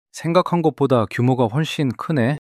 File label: surprise